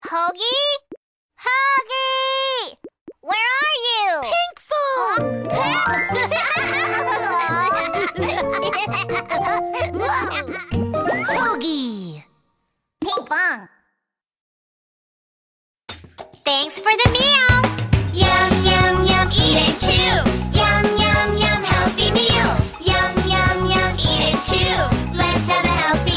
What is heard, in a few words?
songs for children; healthy habits